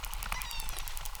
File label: Chirp, acquarium